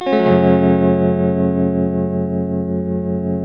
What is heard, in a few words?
TELTMUMIN G, TELE CHORDS, Guitars; Spectrasonics; Hans Zimmer Guitars VOL-1